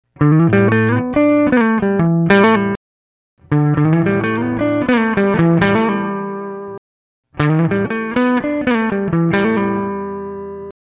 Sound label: verbzilla spring plate